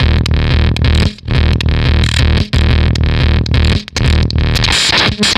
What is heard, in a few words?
Fuzztest drum fuzzfactory